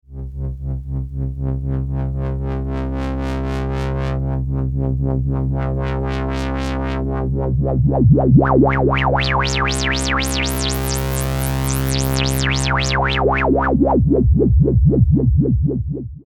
filter lfo

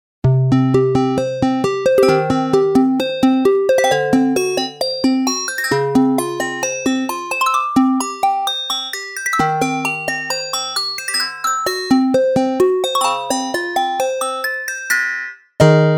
Nonlinear Labs C15 Bell

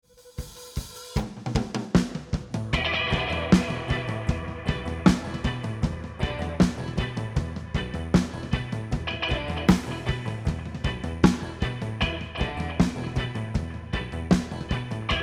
Psych Rock Dry

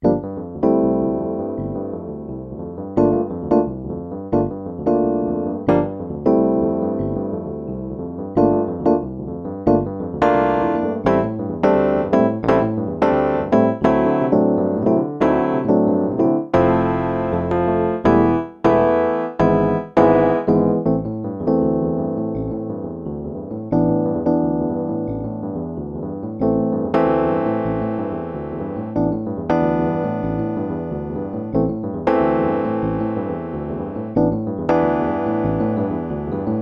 Electric Piano

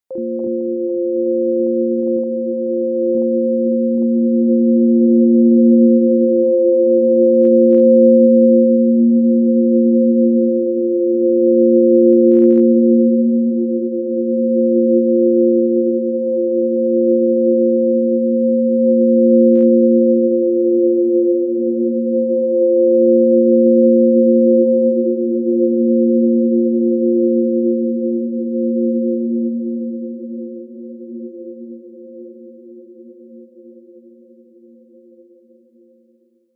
Sine Swarm mit Effekten; Dawesome LOVE 1.0.1

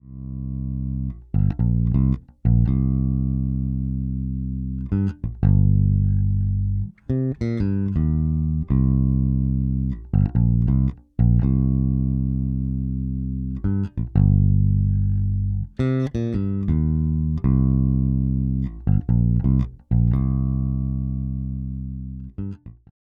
Warwick Gnome i Pro V2 Jazzbass Both Pu

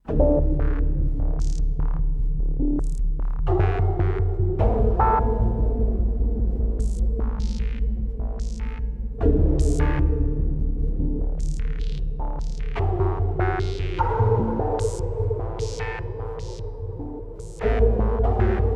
Boss SY-1000 Preset

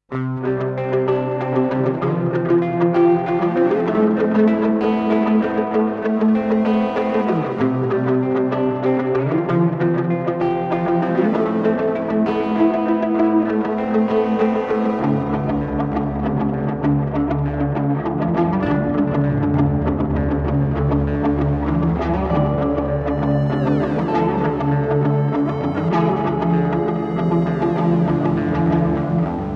Headrush Looperboard Loop Overdrive

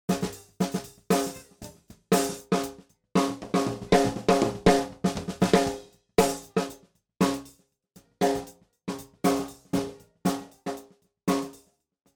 Roland SC Snare Heavy Gate

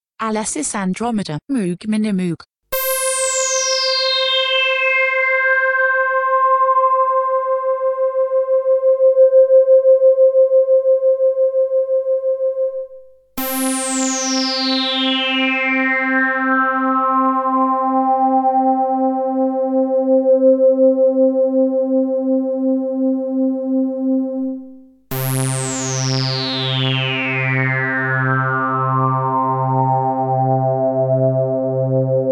Minimoog Filter Sweeps

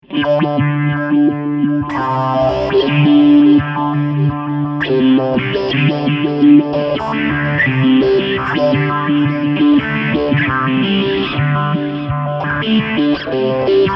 dubstep

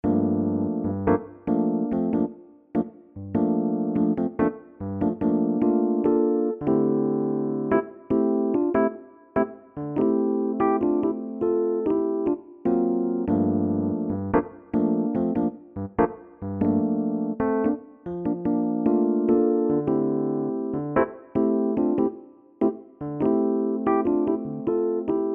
DK6 E-Piano Room

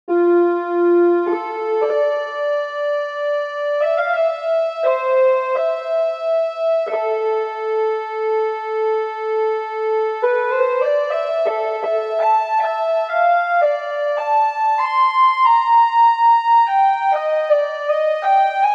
Pulse Lead Reverb

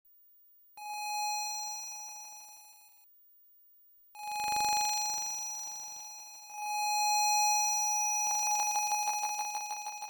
Elmyra Delay

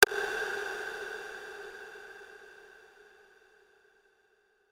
808 Rim Long Reverb

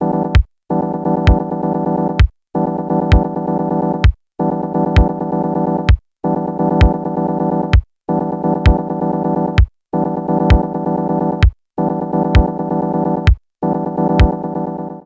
Erica Synths Pico Decay

